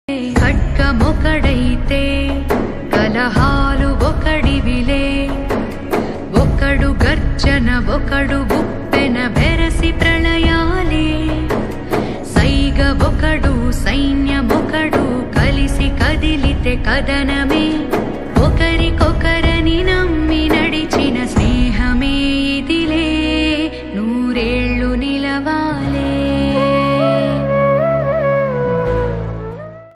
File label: song ringtone telugu; salaar; prithviraj; prashanth n